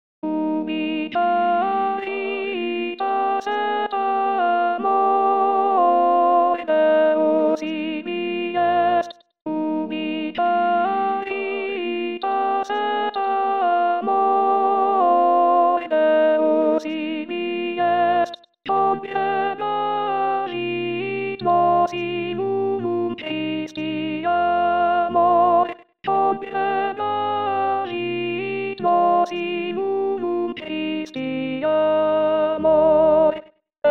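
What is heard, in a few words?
alto; Durufle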